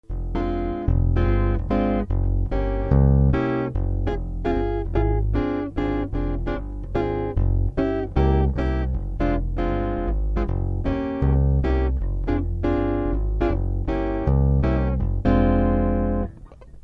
Close Pos m6, stick; slimbuckers

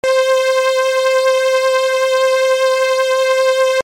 ARP Chorus All

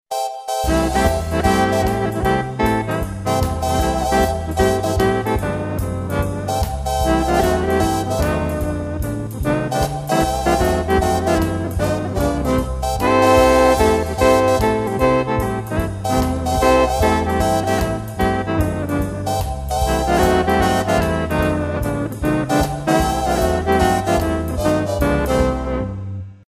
Reaktor Big Band; wind sounds

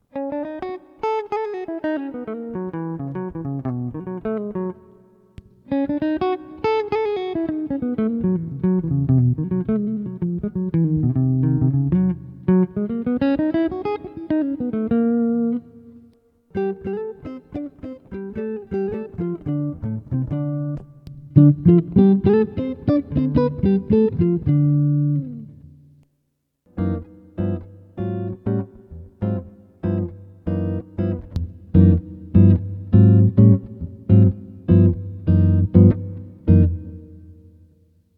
Fender

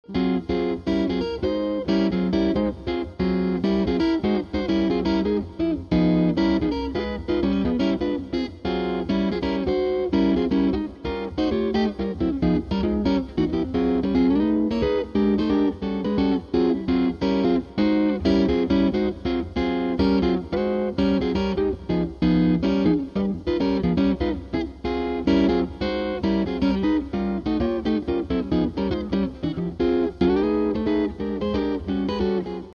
Two Handed Block, stick; Two-handed block chord solo (no bass); Chapman Stick

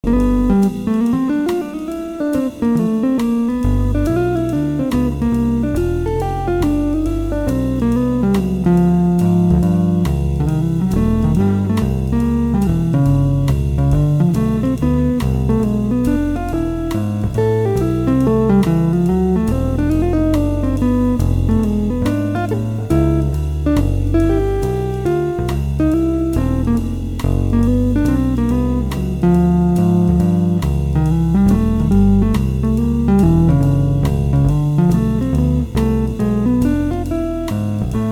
Single Note Solo, stick, Blues Noodling; Single-note solo over walking bass; Chapman Stick

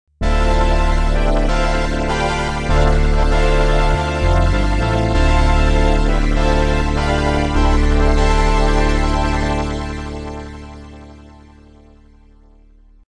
Reaktor, arp strings; Reaktor ARP Omni 2

